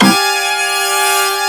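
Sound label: G#4 orchestra; Northstar; The Wizard VOL-1; ORCHESPHERE 2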